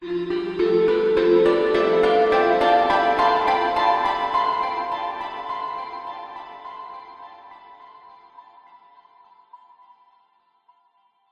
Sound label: musical heavenly dreamy plucked swell; zapsplat